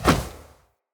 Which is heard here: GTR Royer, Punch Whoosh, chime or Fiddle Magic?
Punch Whoosh